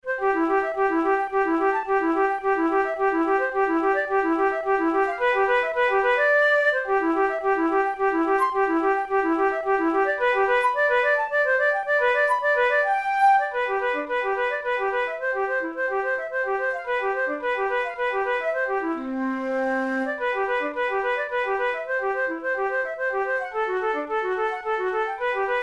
studies for the boehm flute; drouet 72

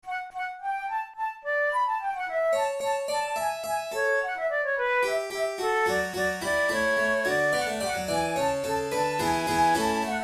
allegro, flute; corelli; violin sonata op5 no1 v

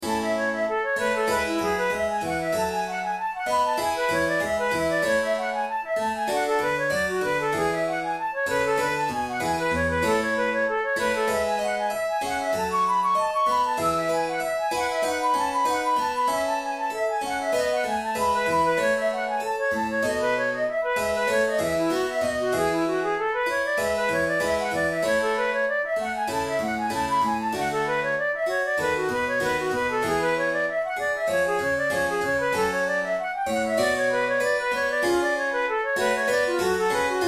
allegro, flute; corelli; violin sonata op5 no6 iii

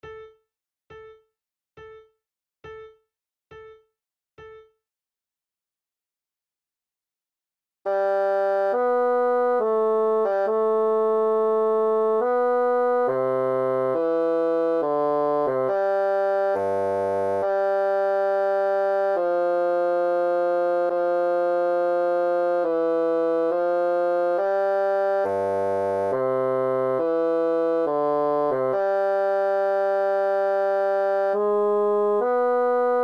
adagio, flute

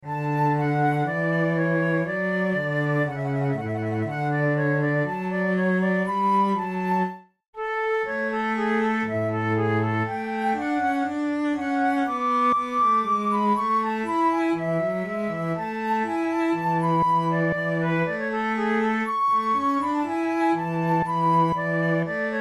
flute sonata adagio

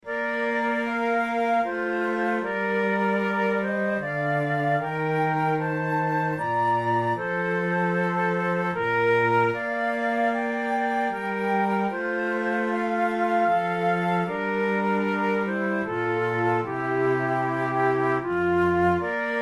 trio sonata sarabanda, flute tunes; corelli

